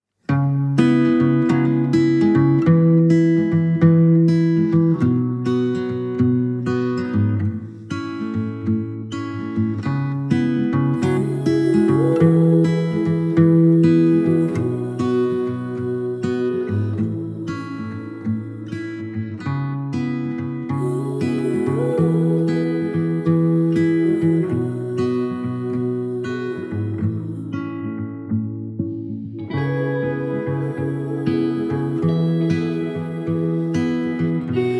in the forest ambient acoustic guitar instrumental background music